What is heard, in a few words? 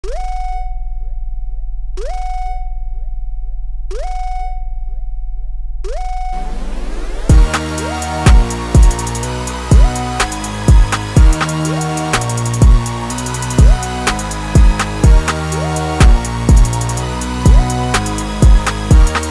Instrumental